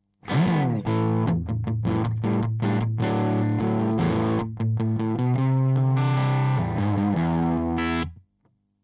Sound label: crunch Position 3 KSM313 back